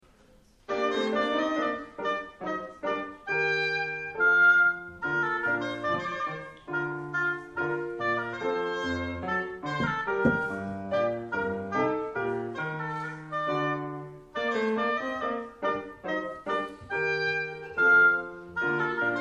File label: gavotte, concert; live au vox; telemann